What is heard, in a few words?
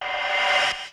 Reverse, Yamaha DD-35